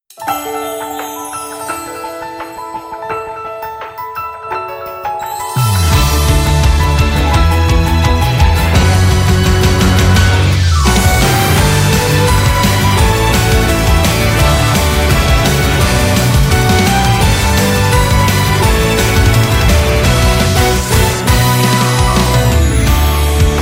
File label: Off Vocal